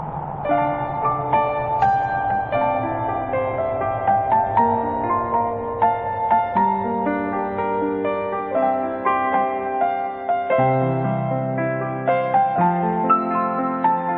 krishna theme piano

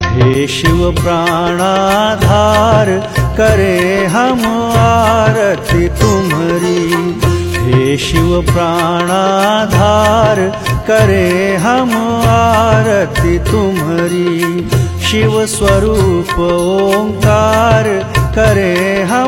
Bhayharan Nath Aarti

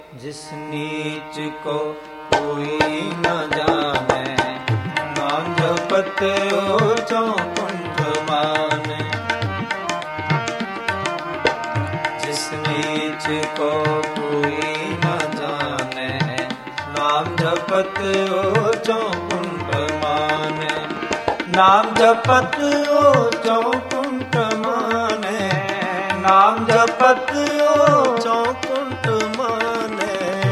Sikh Ringtone

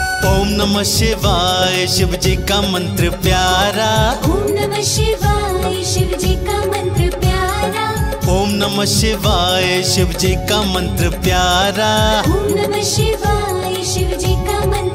om namah shivay

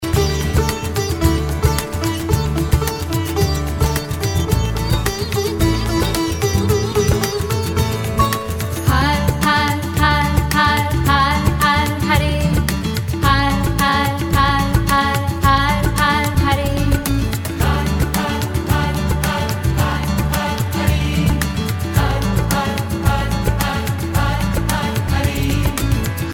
Sikh Ringtone

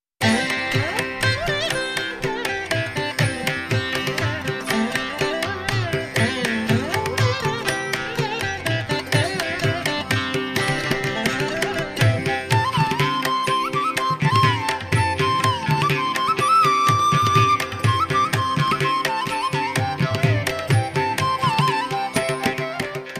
kerala classical, krishna ringtone

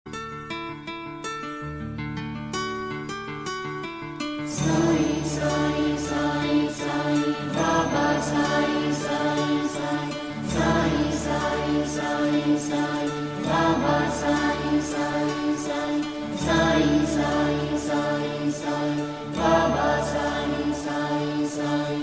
sai stuti ringtone; saibaba

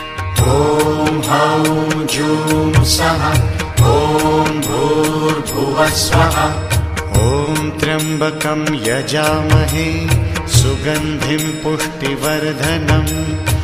Mahamrityunjay Mantra

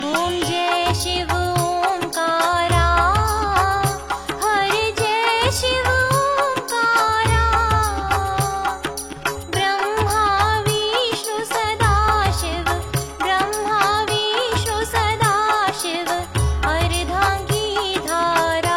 om jai shiv omkara

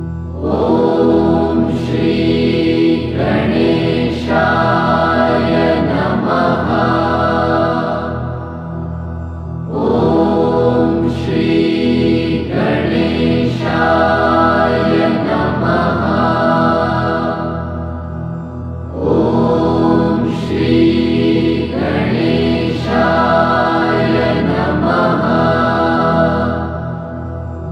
Shree Ganesh Mantra

